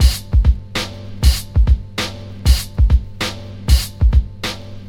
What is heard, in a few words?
BREAKS